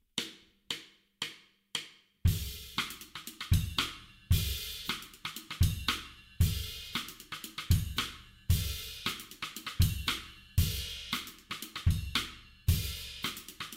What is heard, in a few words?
Grooves